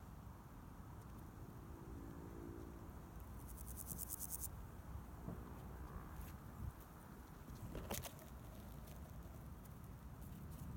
Meadow Grasshopper

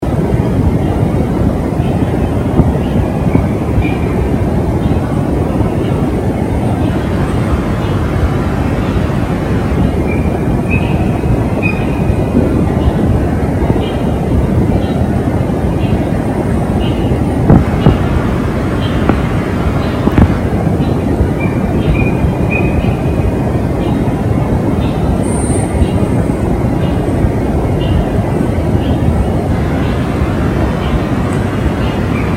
late night bird call

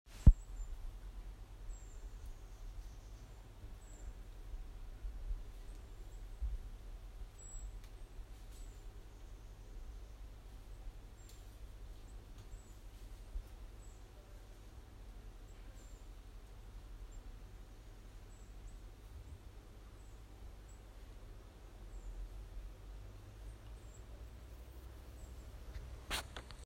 Bird; Thorpe Fores

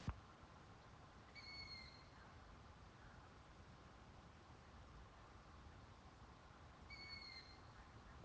mystery call

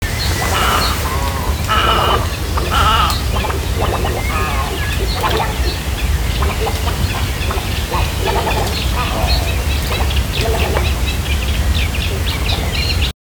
Unidentified bird call

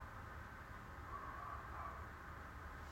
Random bird call, Romford area; I've never heard a bird call so loud and distinctive

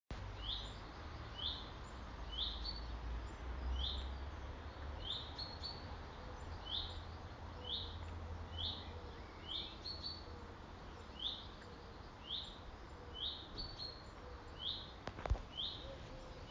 Annoying bird

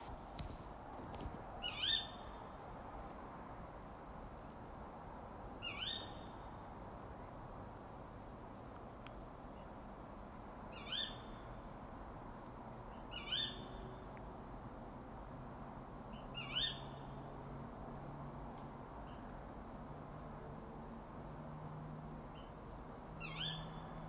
Bird noise

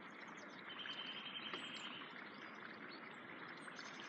Bird call

